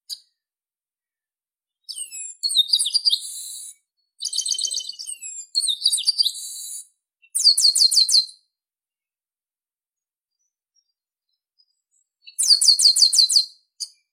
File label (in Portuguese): Canto do do melro de cabeca castanha